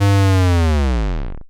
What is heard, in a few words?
fail buzzer